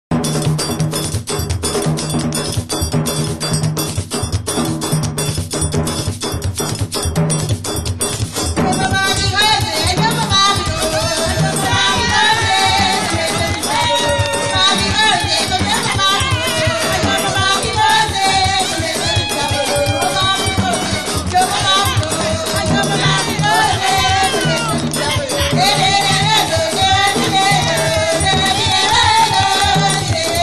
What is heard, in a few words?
Sega Tambour